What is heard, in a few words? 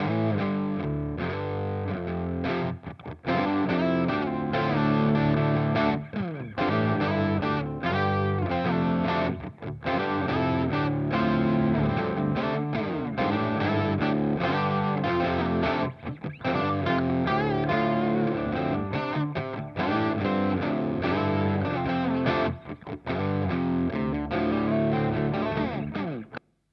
Boss GT-1000 Core Klon Centaur; Vergleichstest